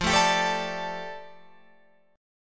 F 6th Add 9th F down Guitar Open F, chord; F6add9 strummed

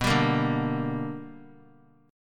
B Minor Major 7th Sharp 5th, chord; strummed; JGuitar

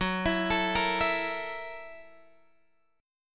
Gb 7th Sharp 9th Gb down Guitar Open G

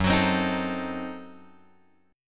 F sharp Diminished 7th F sharp; F#dim7 Chord {2 X 4 5 4 5} strummed

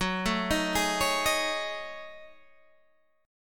Gb Minor 6th Add 9th Gb down Guitar Open G

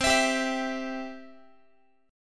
C Major, Guitar; strummed; x,20,19,17,20,x

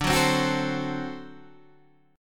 D 7th, down, Guitar, Open G

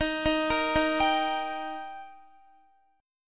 D sharp Major D sharp Guitar, chord; arpeggiated; JGuitar

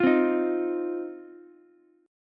C Minor Double Flat 5th, Ukulele; strummed; 10,12,11,x; JGuitar

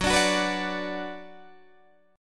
Gb Suspended 4th Sharp 5th, Guitar DADGAD

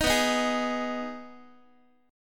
B 6th B down Guitar 4A 4E 5A 5D 5G sharp 6C sharp; strummed

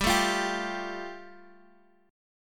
G 7th Suspended 2nd; G7sus2 strummed

